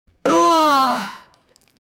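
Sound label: death sound of pleasant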